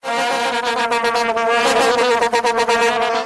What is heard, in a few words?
some vuvuzelas making noise